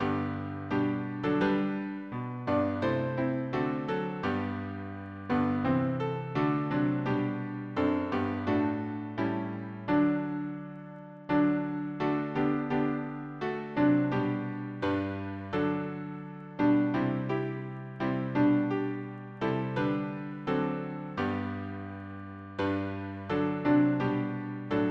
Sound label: harmony piano